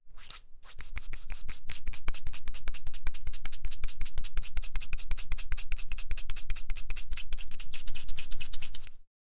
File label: quickly mixing, smearing; sound of quickly mixing c